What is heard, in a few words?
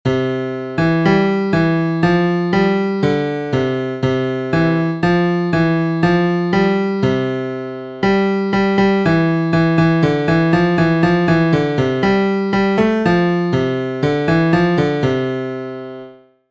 A Trickier Tune